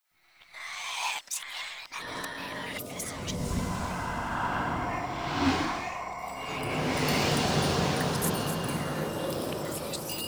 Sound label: Handshake; Orlick Handshake